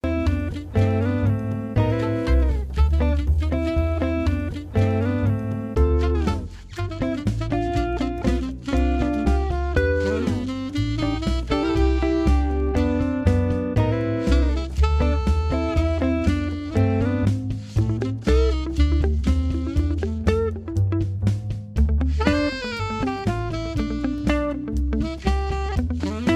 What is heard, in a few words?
slick jazz comp